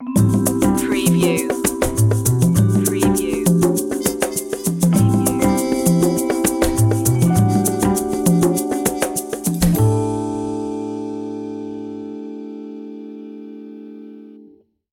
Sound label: jazzy opener; faster jazz